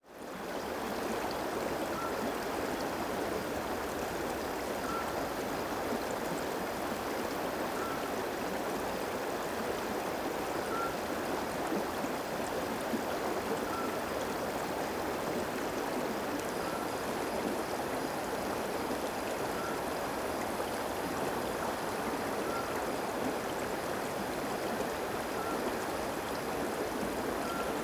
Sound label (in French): Ambiance du lac, Rivière calme